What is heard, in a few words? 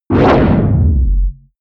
whoosh distorted rip bass